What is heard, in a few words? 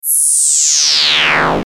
Descend Slow Laser; Descending Sound Effects; Blastwave